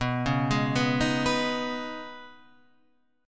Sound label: Db 7th Suspended 2nd